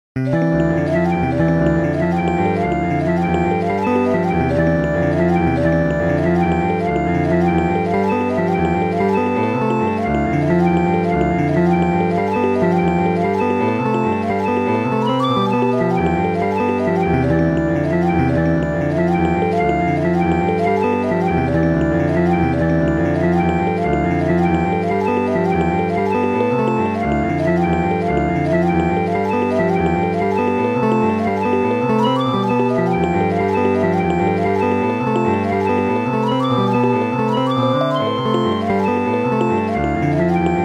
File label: perpetual mobile for rhodes piano electric guitar cello and shakuhachi